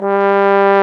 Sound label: BRS BSBONE, Bs.Bone Solo; Roland LCDP06 Brass Sections; Bs.Trombones